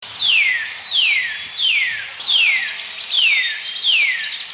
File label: cardinal